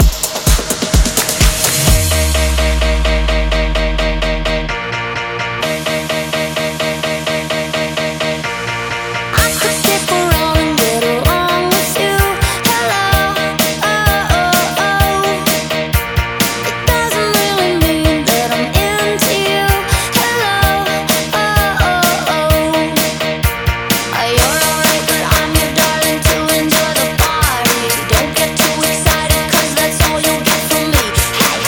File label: REDRUM 128 bpm Eb